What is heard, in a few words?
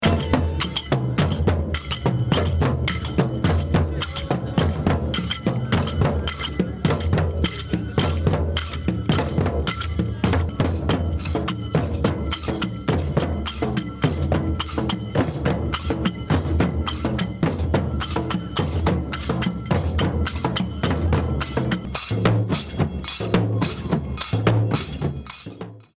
Tabl; Vocal&Effect